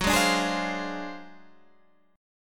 D 7th Sharp 9th Flat 5th Gb, chord

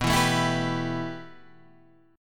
B 7th Suspended 4th Sharp 5th B down; B7sus4#5; strummed; JGuitar

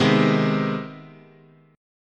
C Minor Major 7th Sharp 5th, chord

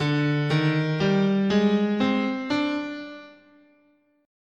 D Iwato, guitar, scale

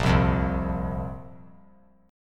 Bb Suspended 2nd Bb down; strummed

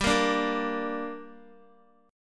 G Minor G down Guitar, chord; Gm strummed

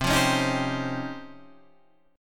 C sharp Major Sharp 11th C

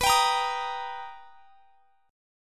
B Augmented Major 7th, down; B+M7; strummed

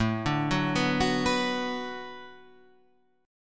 B-Minor

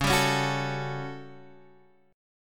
A 13th Db, guitar chord

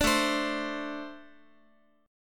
A-Minor Double Flat 5th A Guitar; strummed; JGuitar

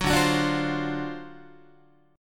D 7th Sharp 9th, Guitar; 9,10,9,12,9,11; 4A,4E,5A,5D,5G#,6C#; strummed; JGuitar

